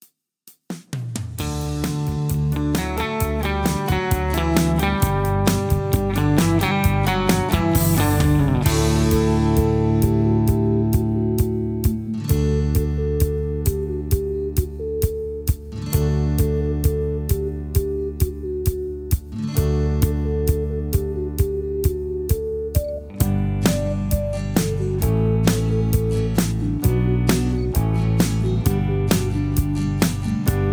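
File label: Instrumental with leads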